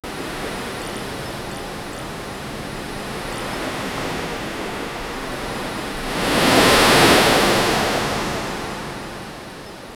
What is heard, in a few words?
ocean waves crashing on